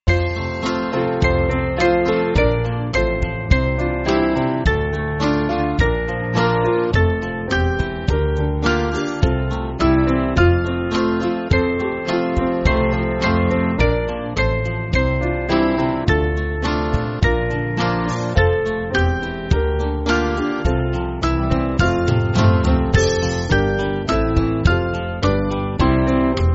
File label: Band, Free Music Backing Files for Churches; I Know A Rose Tree; Rosa Mystica